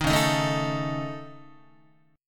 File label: Db Suspended 2nd Sharp 5th Db Guitar; strummed; Dbsus2#5; JGuitar